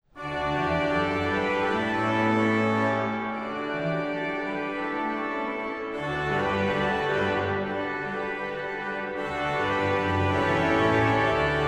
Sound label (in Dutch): Orgelsolo psalm 135